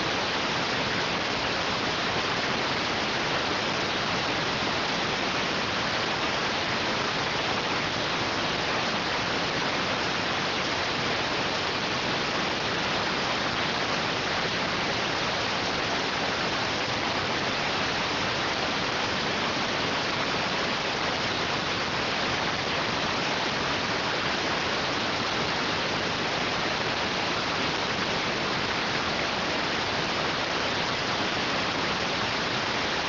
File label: rtty rx